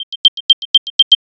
Find My, AirPods Sounds, Case

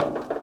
bleacher step; Golden Crayon Sounds

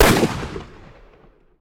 admin gun; Original TK Sounds

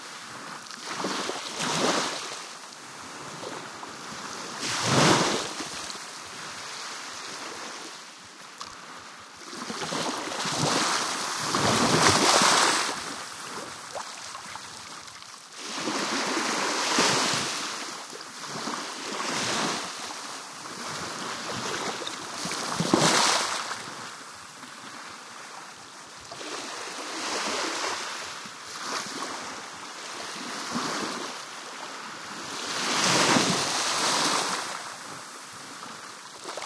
waves; Golden Crayon Sounds

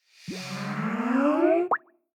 Skype Login; Skype Sounds

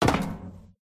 metal step; Golden Crayon Sounds

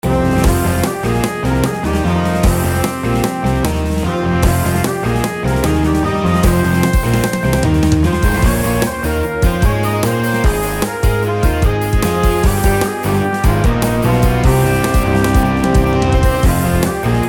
stadium battle; Manamon Music; Manamon 2

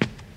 stairs step; Golden Crayon Sounds

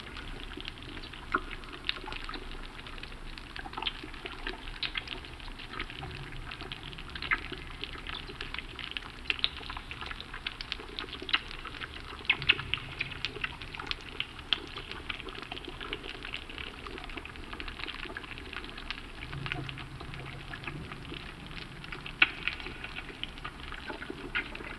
cave; Golden Crayon Sounds

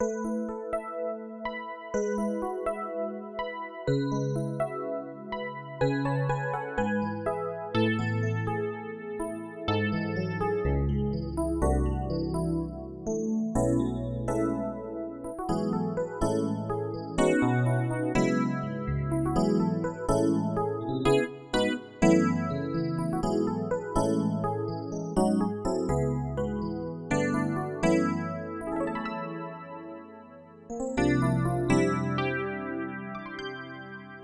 e. piano, Yamaha NP-30; Demo Songs

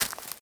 weed step; Golden Crayon Sounds

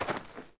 grass step; Golden Crayon Sounds

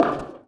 bleacher step; Golden Crayon Sounds

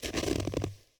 snow step; Golden Crayon Sounds

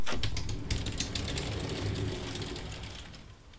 door open; Golden Crayon Sounds

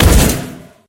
diamond fall; Golden Crayon Sounds

